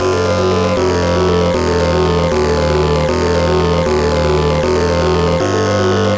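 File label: background music; Side Arms Hyper Dyne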